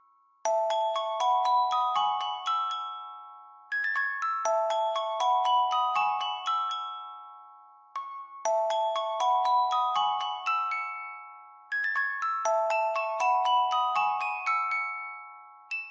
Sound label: music box